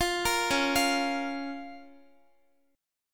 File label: C sharp 6th C sharp down Guitar; C#6; arpeggiated; tuning=4E,4A,5D,5G,5C,6F